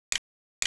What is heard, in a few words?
Click; pascal calculators